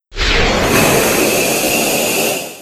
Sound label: nitro out